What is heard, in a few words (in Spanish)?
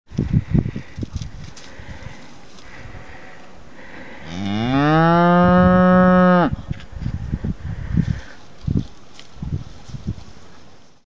Vaca peruana MUGIENDO